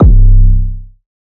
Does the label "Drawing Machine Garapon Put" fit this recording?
No